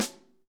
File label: snare dry; Real Mega Drums